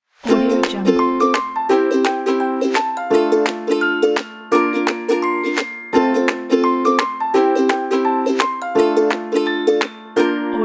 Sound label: happy music pack